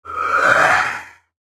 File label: shade, animals; khanat; sound library